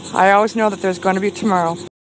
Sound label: Laugher